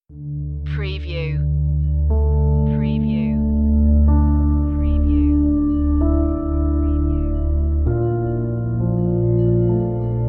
eden, Ambient Atmospheric, A calm and ambient synth and strings track with a soft piano melody,